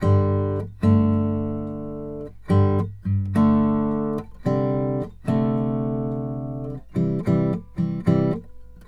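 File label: Country Guitar, Intro Alt; Digidesign; Pro Tools Essential Loops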